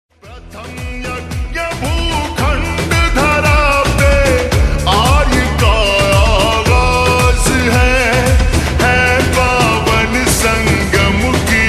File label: Slowed Reverb Ringtone